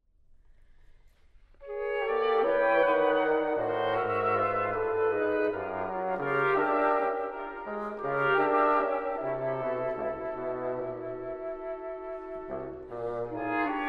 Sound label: Quintet for Winds